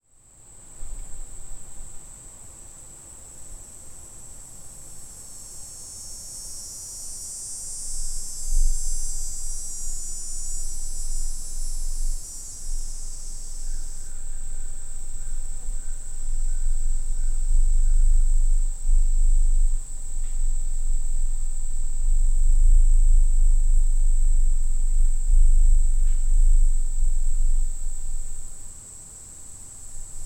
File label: Dog day Cicada; cicadas; Neotibicen davisi; Davis' Southeastern Dog-day Cicada